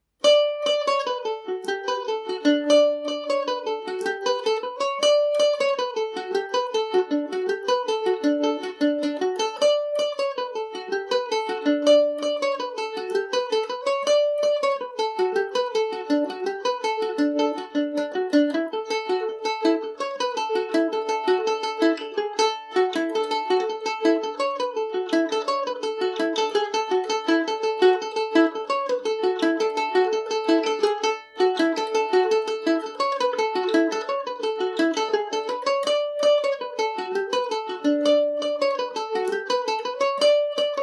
bittersweet jig dmajor, mandolin; The Bittersweet Jig; Falder mandolin

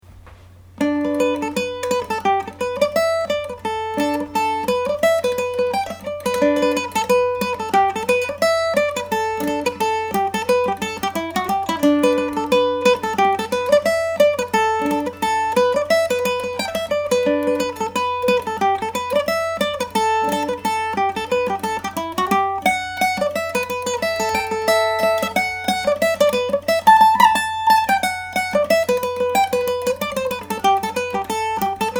woman of the house, mandolin

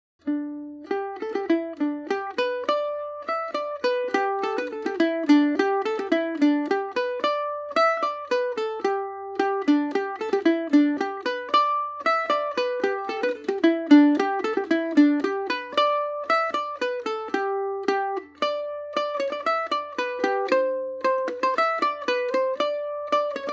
john brosnans polka gmaj, mandolin

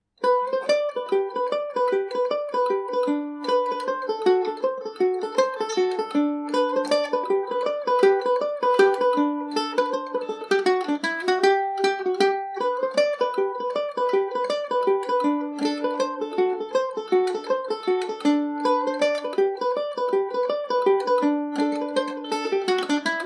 hornpipe, mandolin; Gerry Crossey's Hornpipe; G&O #34 mandolin

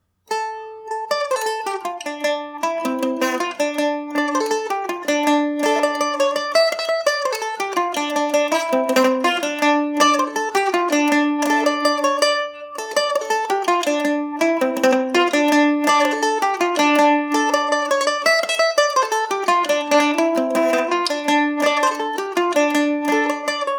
Irish mandolin; julia delaney cleaned up

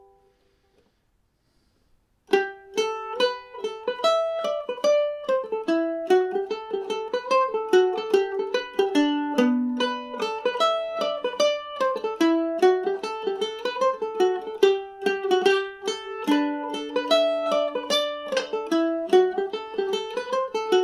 barndance, Irish mandolin; james gannons

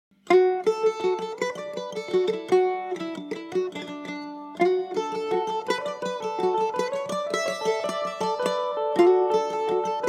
mandolin duet; The Hare In The Corn; John WIlliamson and Aidan Crossey

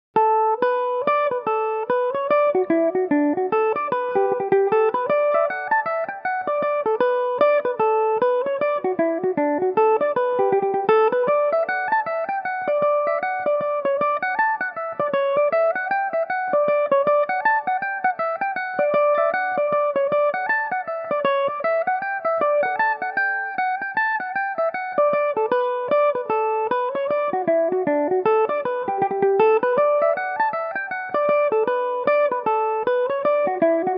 holy land dmaj, mandolin; The Holy Land; Revelation Jaguar electric mandolin

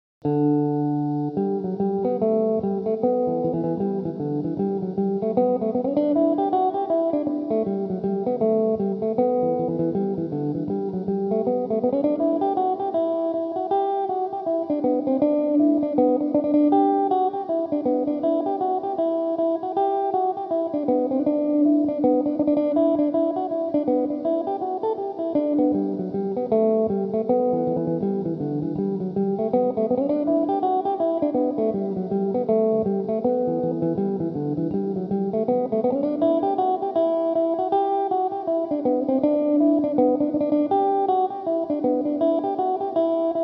tenorcaster, mandolin; The Hare's Paw; Recorded for the Mandolin Cafe's Song-A-Week Social Group

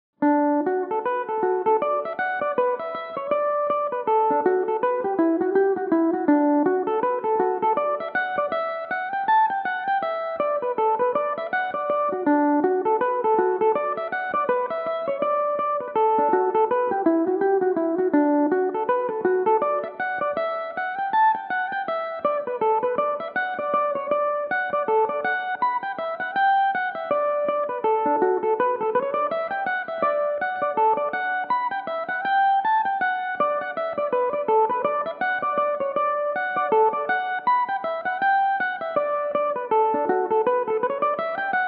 reel, D major, mandolin

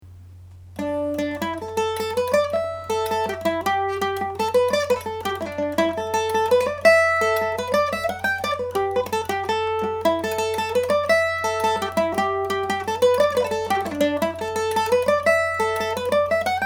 mist covered mountain, Irish Mandolin

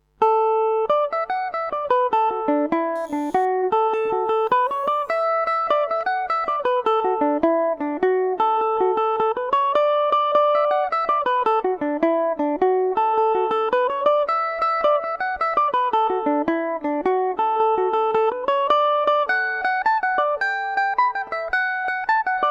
frost is all over dmaj, mandolin

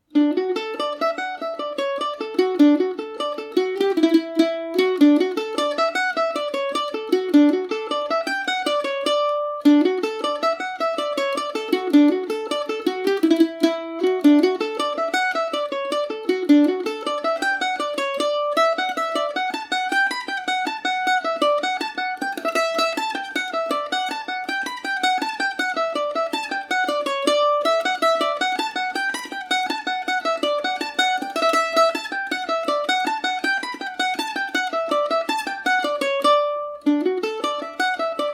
jacksons fancy dmaj, mandolin

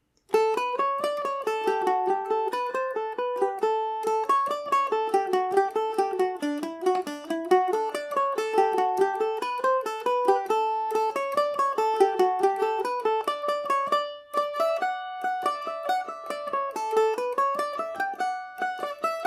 on my Falder mandolin; One Tree Hill/The Lilies Of The Field; The first reel is one of my own compositions. The second is a traditional tune

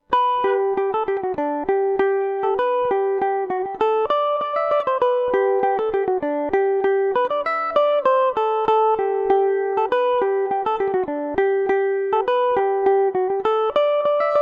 kinnard polka gmaj, mandolin

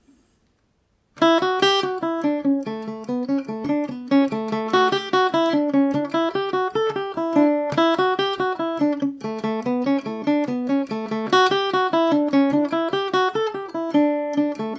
flowing bowl dmaj revisited, mandolin

